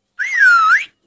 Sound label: Whistle notifics